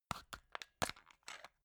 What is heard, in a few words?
plastic remote battery compartment open